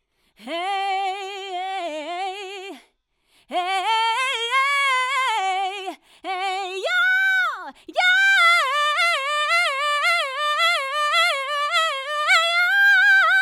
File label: Soul